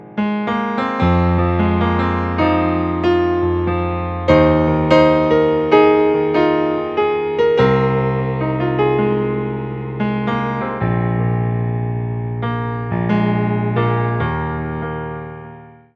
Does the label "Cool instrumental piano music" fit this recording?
Yes